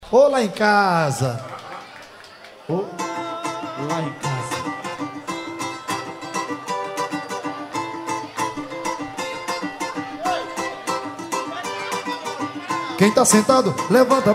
swing samba ao vivo; dodge ram

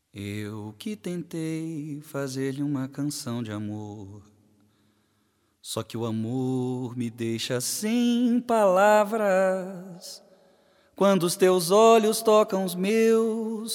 Canção de amor, voz e piano

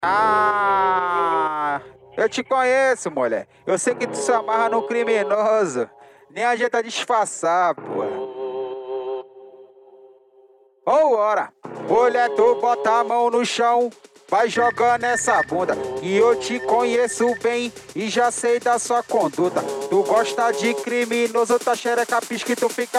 mega funk

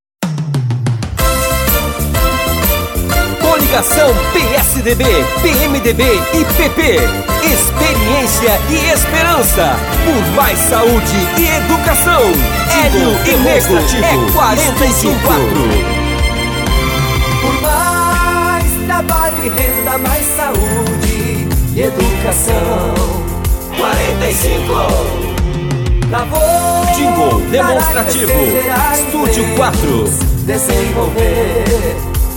jingle parodia; prefeito

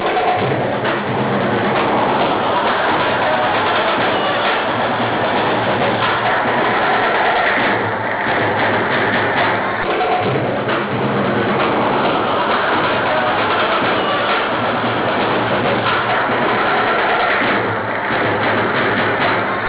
gritos, torcida; torcida crb chopp

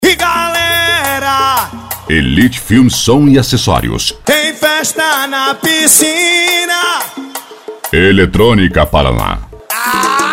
eletronica; parana; edicao fervo 2016

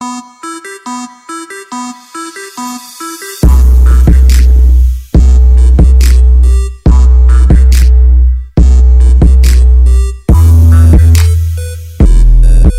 rap

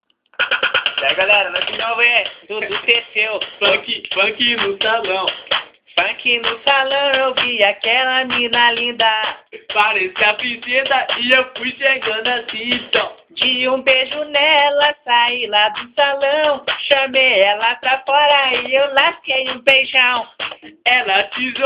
funk

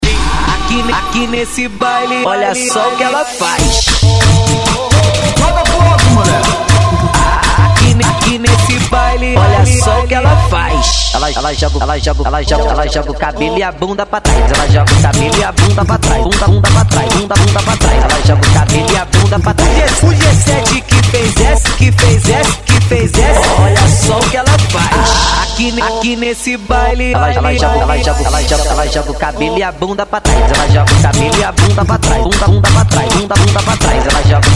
funk; funk frequencia volume 2

